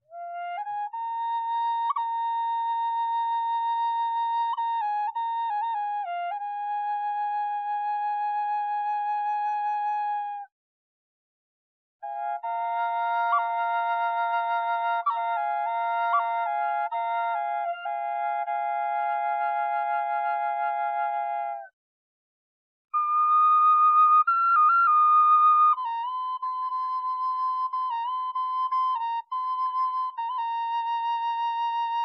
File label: Drone High F, Native American Drone Flutes; Scales; Full Spectrum Scale